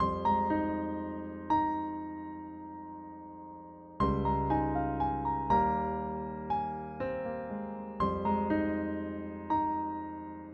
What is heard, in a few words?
Piano Loop 120BPM Fmin, classic; 99Sounds; Monosounds; Music Loops; Instrument Loops; Pianos